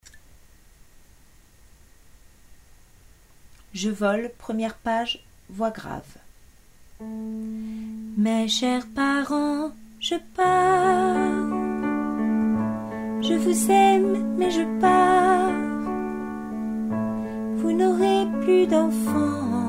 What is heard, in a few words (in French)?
voix graves